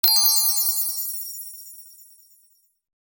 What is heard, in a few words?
Fairy dust magic sparkles